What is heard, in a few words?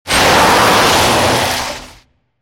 skid